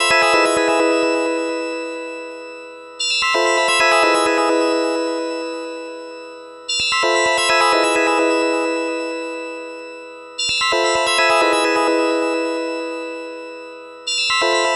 NRG Pad

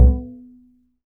Arp, upright acoustic bass; Larry Seyer; SWITCHABLE POSITIONS

